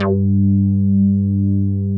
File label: JX HARD G3, HARD BASS; Drums In Your FACE!